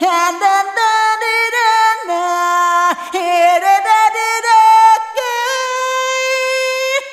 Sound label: Arrhythmic F